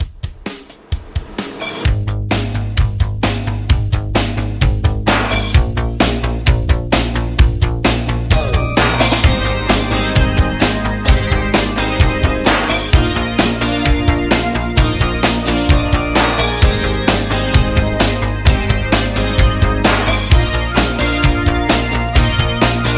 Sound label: 80s Redrum; Remixfreakz